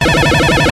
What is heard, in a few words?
retro laser blast; game sound; Skyclad Sound